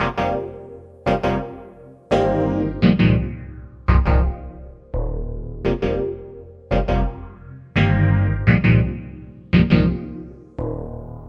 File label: synth filtered